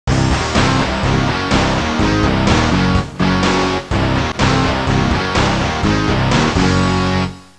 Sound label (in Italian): suonerie musica rock